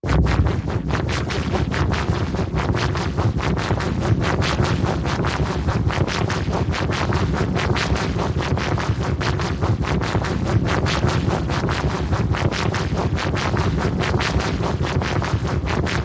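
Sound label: Torpedo Washer Machine, centrifuge spin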